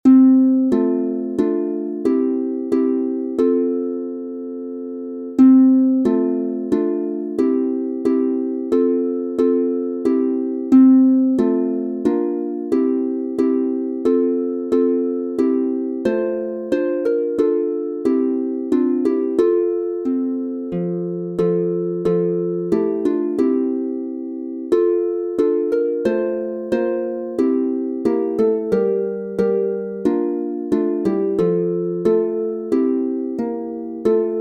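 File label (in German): F Dur 3 stimmig instrumental, Harfe; Christnachtigall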